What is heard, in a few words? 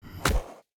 zombie strike; Shelter